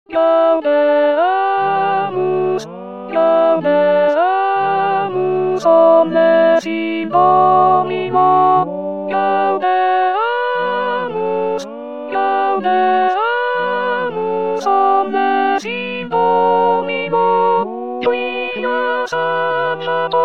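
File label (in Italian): gaudeamus omnes in domino contralto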